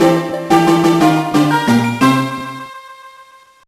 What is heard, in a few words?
Fanfare Get Weapon; Sigma Star Saga; GBA